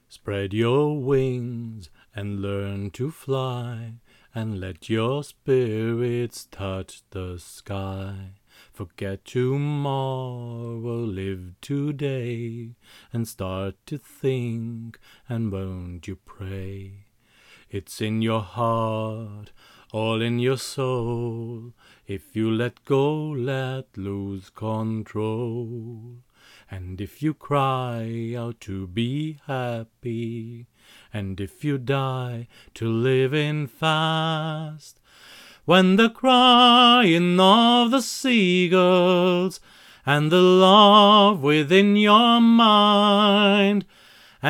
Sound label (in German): rockballade vocals